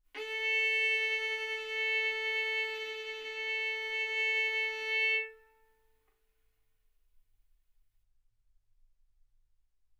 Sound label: Violin arco mf sul A A4 B4 stereo; single mic